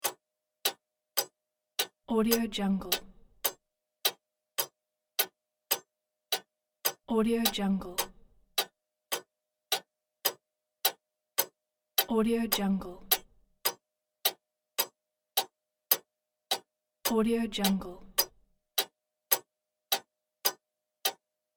clock

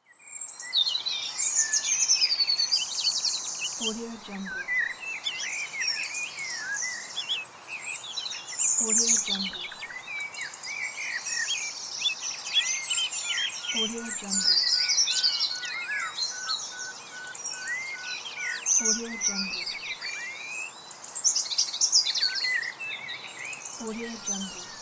birds chirping; lfo-sound

